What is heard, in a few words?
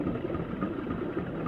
cart moving; minetest; carts